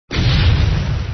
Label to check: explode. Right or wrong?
Right